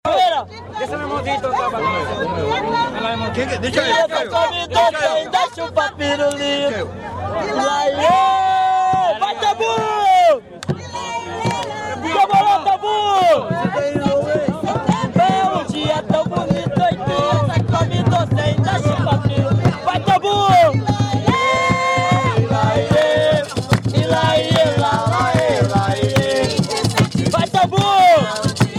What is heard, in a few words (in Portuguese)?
jongo de guaratingueta